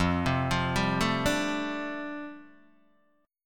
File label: F 6th Add 9th F down Guitar DADGAD, chord